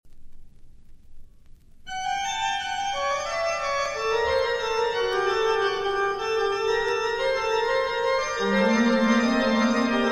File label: Prelude; Prelude and Fugue in G minor